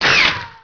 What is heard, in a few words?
ricochet; Chase Hollywood Stunt Driver; XBOX